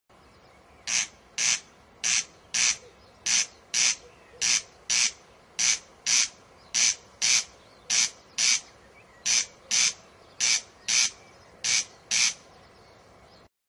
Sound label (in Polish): derkacz; zakole wawerskie